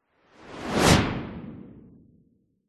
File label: sound design fast whoosh; zapsplat